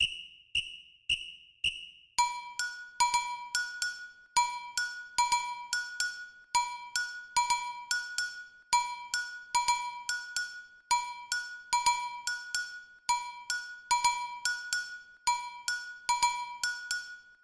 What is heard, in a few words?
reggae agogo